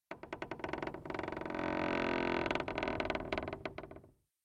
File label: Shed - Wood - Creaking - Short; RadwinIsland